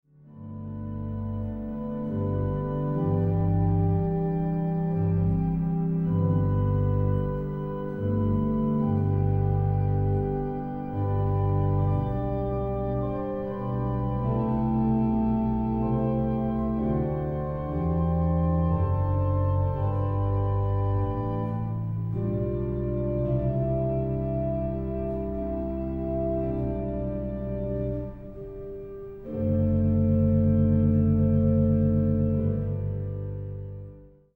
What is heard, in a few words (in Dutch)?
improvisatie psalm